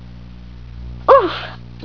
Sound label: oof